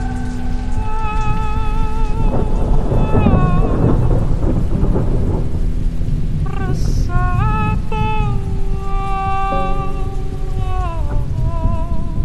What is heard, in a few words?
Frog Series Music; Ghorbaghe